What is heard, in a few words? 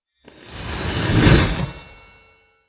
light charge; candlelight